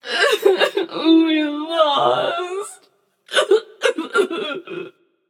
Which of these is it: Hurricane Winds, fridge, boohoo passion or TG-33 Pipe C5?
boohoo passion